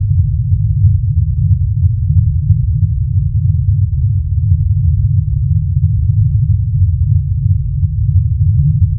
swim

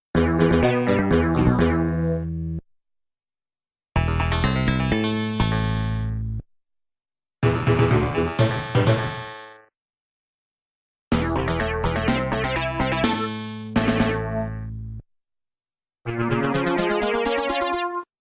Jingles; Globulus; Amiga

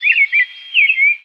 bird random; Level4 Hidden World

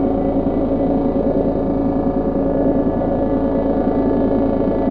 Pickups Powerups

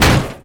Collision

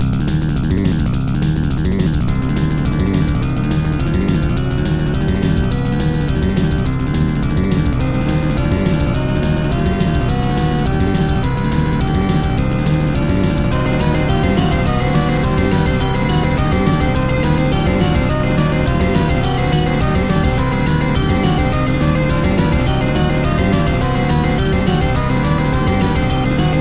Fast Organ Theme; Tales from the Crypt; Data East; pinball

